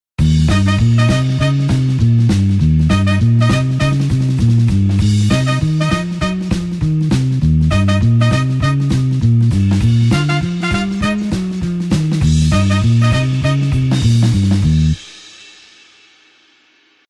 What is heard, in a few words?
blues horns up Eb